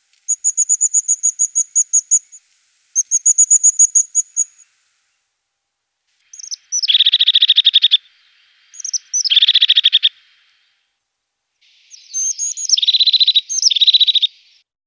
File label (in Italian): Cincia dal Ciuffo Parus Cristatus; Versi Animali; Il Forum della Fotografia Naturalistica